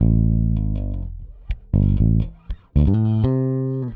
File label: Pop, Bass